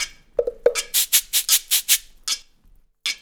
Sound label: Roots Reggae; Sounds Good - Roots Reggae